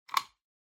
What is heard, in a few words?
Wall Outlet Cover Key Lock